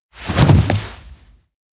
stand up fast; anemoi

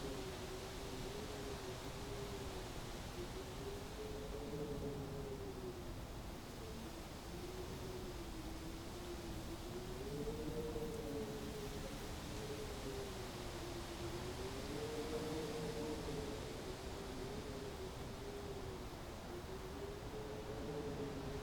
outdoor old ranch quiet

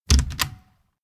door bedroom open; anemoi

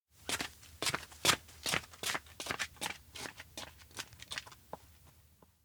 steps outdoor hurry home; anemoi

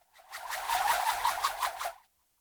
Spinning frisbee; anemoi

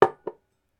put cup table; anemoi

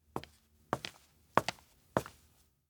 steps indoor observatory walk toward; awayuki; anemoi パッケージ版 1.1

